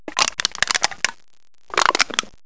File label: can crush